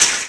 bouncy newsstand hit; bouncy objects; Tony Hawk's Project 8; XBOX

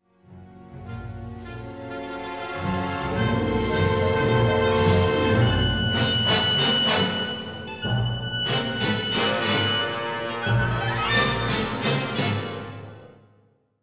tension, music

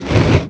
wood slide rotate; Tony Hawk's Project 8; XBOX; moveable pieces

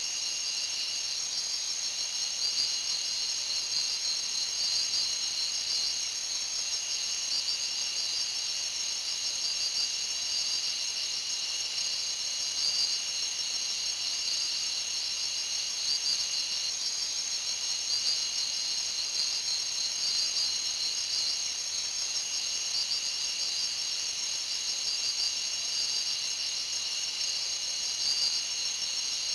ambience; Street Hoops; XBOX

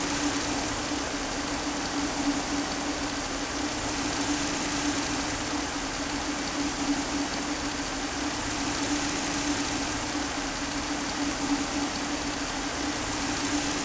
door Stab; impacts explos; quiGon

